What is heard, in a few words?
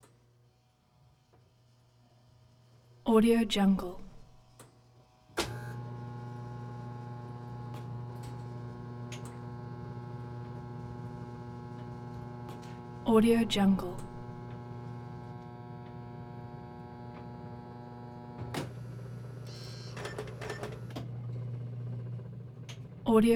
photobooth flash cycle; collanderson